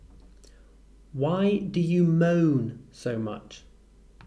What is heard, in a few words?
moan